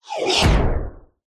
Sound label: horror monster creature impact growl squeal